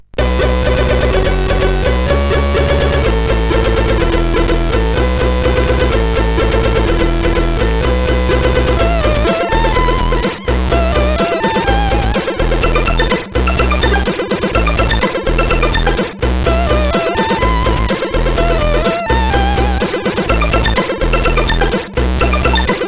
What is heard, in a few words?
Intro Dragon tune 1, CSG8580R5